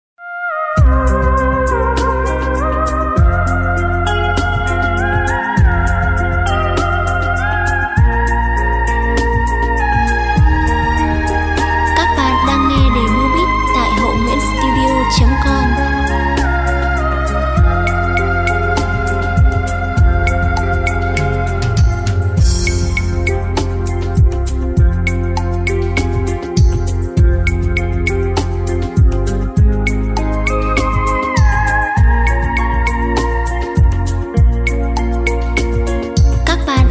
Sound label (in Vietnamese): Nhat nang beat